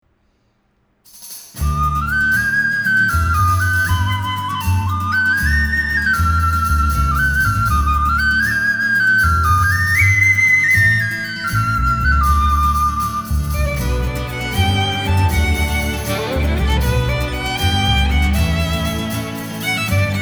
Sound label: Slip Jig